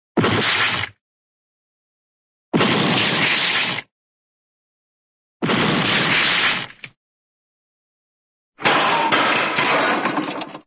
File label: crash, wood wood crunch; cartoon